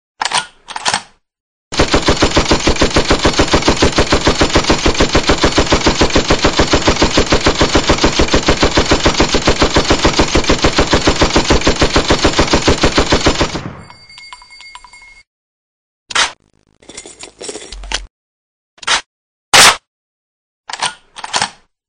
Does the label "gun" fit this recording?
Yes